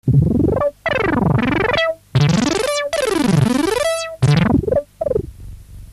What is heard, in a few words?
KB-800 filter hack